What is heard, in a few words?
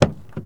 Truck Dakota Door Open; Trucks